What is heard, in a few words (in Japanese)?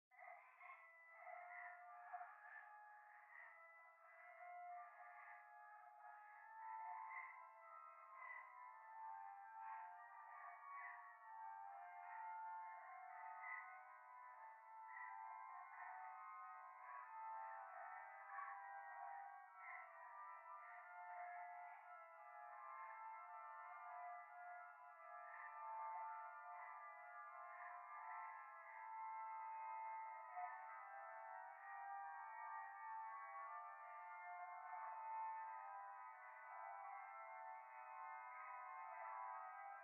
夢の中 喘ぎ 讃美歌